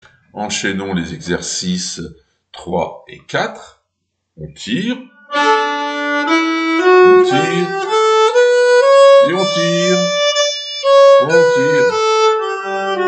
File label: nyckelharpa; Liaisons 3 et 4